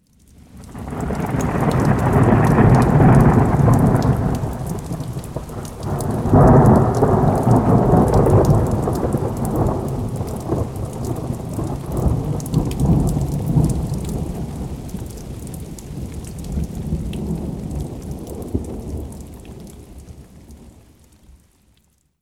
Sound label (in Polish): burza; karty obrazkowe; pogoda w kratke